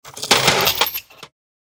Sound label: Toolbox tool search; industrial